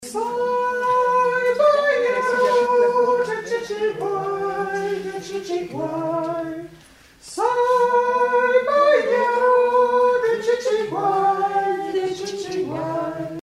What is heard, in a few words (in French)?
chichi SOP Couplet; chants